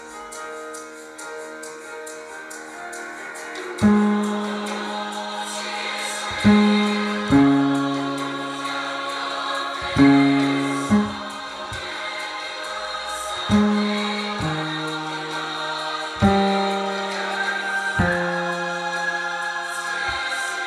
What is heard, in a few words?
BG Bass; einzelstimmen